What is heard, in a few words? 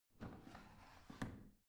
washing machine drawer out soft